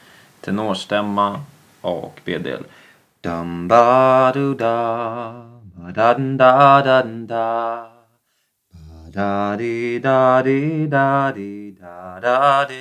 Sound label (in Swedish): Tenorstämma